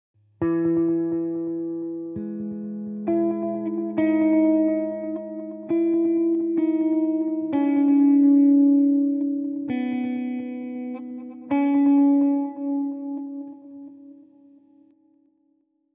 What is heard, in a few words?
Intro Guitar